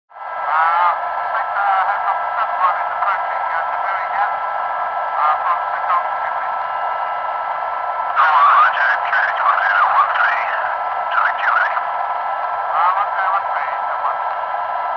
Gazelle Int Radio Com, aerospatiale

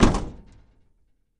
Camaro Door Close Hea, chevy camaro

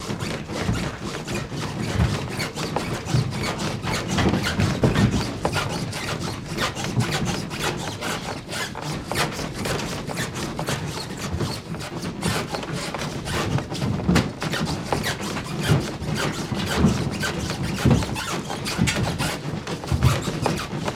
Truck Bed Int Squeak, hits & rattles

